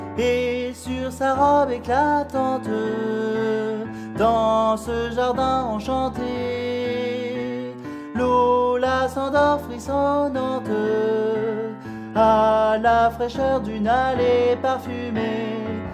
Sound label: Soprano